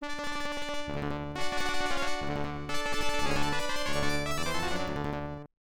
Game Start; pro yakyuu family stadium pennant race; msx2; msx-audio

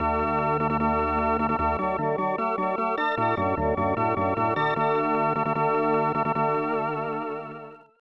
Fanfare ~ Excitement; super bases loaded 3; snes

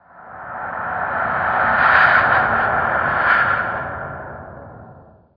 Ambient

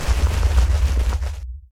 gravestone rumble; Plants vs. Zombies

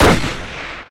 Weapon Rifle Fire

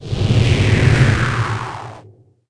Curse

